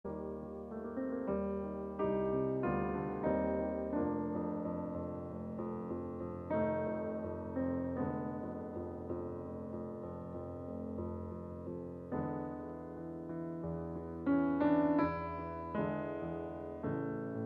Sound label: sonata b dur andante sostenuto; Pianista Andrea Passigli